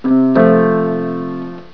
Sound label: YES NOTES